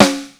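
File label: snare r&b rim, Drum Machines, Yamaha Motif ES-Series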